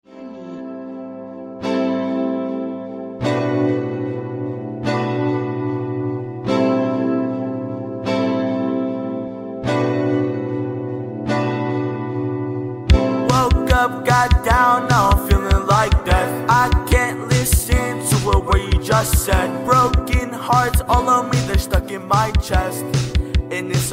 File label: Last Electric Guitar ringtone